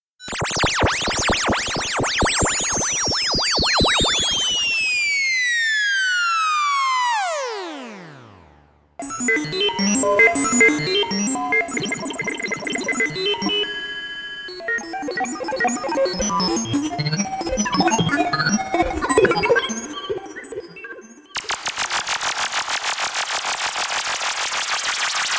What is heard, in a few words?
motifxs VINTAGE SYNTH FX